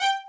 violin

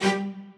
strings

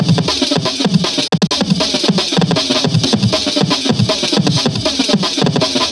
Dr Loop break

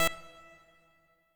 pico

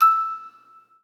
musicbox

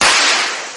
Snare; 锤子

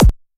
Dr Kick

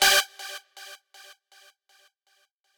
lead

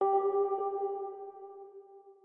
organ

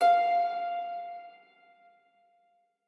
harp

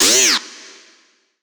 vox

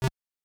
window close; 主播女孩重度依赖

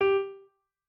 piano

